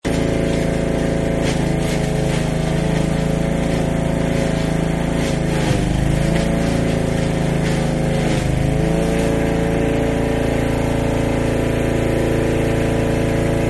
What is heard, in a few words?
Lawnmower Wood Chipper; Lawn Mowers; Machines